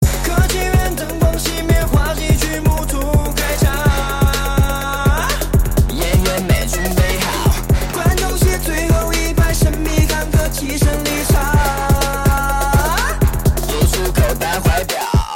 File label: ringtone